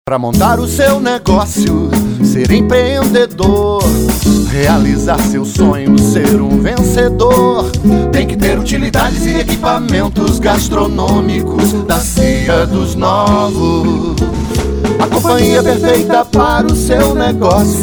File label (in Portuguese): JINGLE; CIA DOS NOVOS COMBO PUBLICIDADE